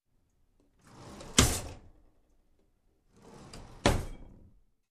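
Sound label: Open and Closing Cutlery Drawer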